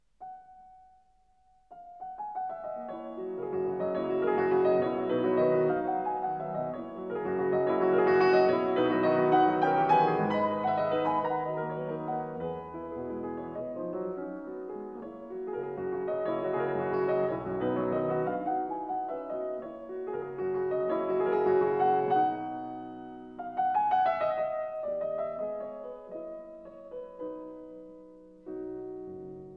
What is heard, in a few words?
Preludes Op.28 11. In B Major dogs